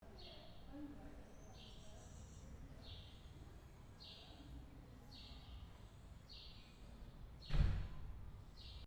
WINDSCREEN Internal Mics Outside; Tascam Portacapture X8

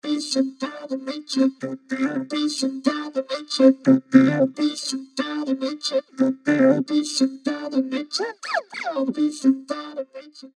vocoder nature straight Voda; els vocoder eiosis

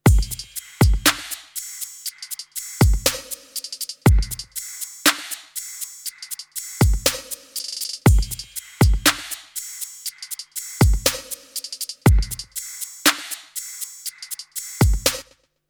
Drumloop dry; DRP-20

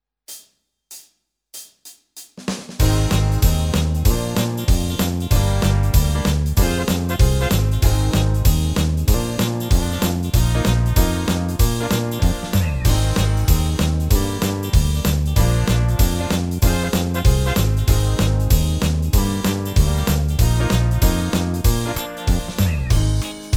Korg PA4X Style

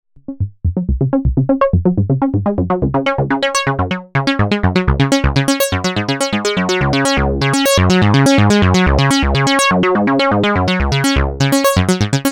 Vermona 14 Arpeggiator

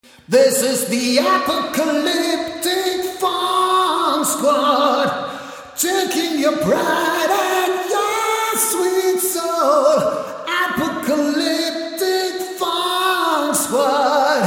Radial Engineering Vocoloco Reverb

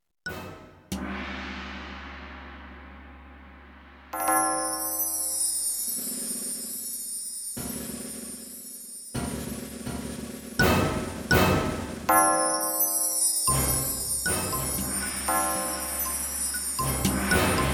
Kit Orchestral; ROLAND SPD-20 PRO; Kit 13

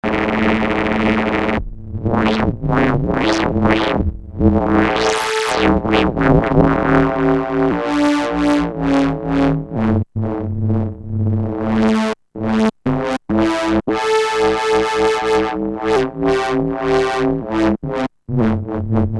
minimogue

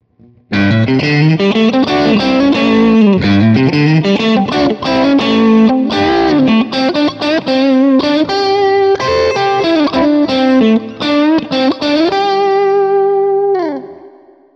Fender Am Orig. 60 Middle and Neck Crunch

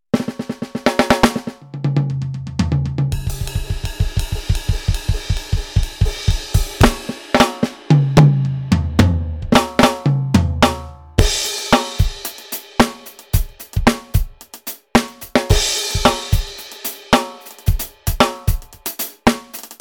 Kit 6; EFNOTE 5; Encore

